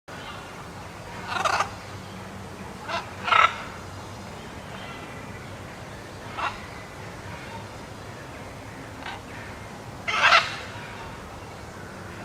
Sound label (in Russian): popugai sine zyoltye ara cistyat pyoryski; ara ararauna